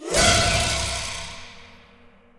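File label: music stinger; Hellboy The Science of Evil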